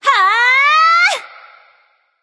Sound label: battlecry; smite